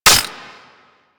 Mech, Weapons Magnum; Warframe; Tenno; Magnus Prime

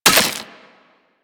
Melee Impact Glass; Warframe; Weapons

